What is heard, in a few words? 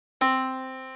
yamaha Disk